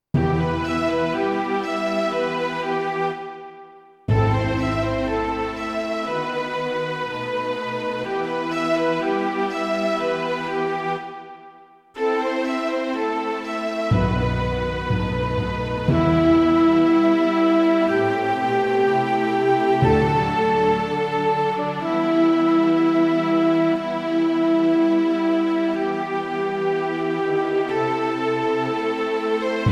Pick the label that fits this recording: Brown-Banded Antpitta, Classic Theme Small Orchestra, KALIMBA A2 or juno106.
Classic Theme Small Orchestra